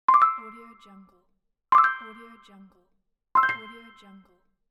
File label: winning; welltone